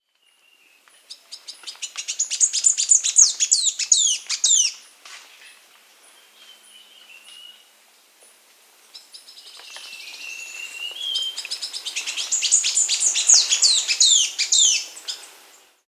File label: Oleaginous Hemispingus (Hemispingus Frontalis), Andean Birds; S. America